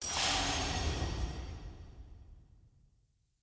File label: UI Faction Choice Select